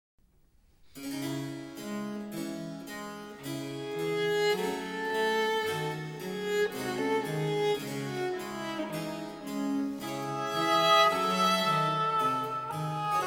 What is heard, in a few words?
Concerto in F Major, Andante; Brandenburg Concerto No. 2; BWV 1047